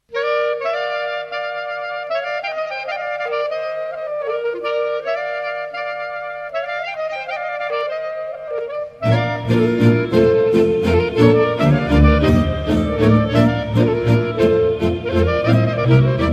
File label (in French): Brau la doua clarinete - Roumanie; BRIUL DOUA CLARINETE